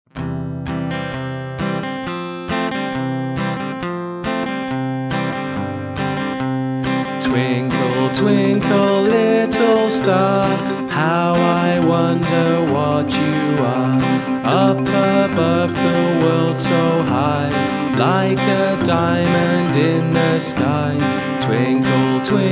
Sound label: twinkle twinkle little star